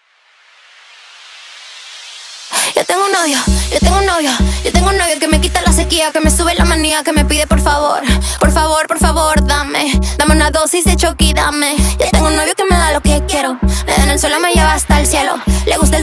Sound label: intro outro 130 bpm